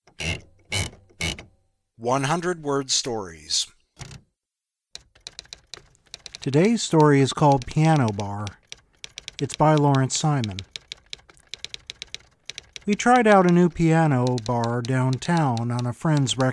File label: the piano bar